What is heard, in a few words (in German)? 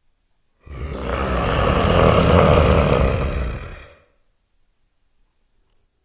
monsterknurren